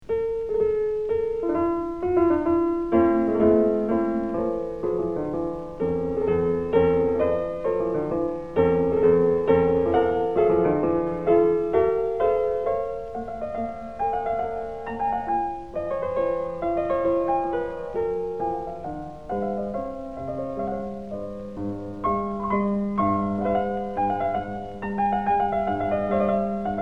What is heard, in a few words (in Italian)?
sarabanda; bach; bwv827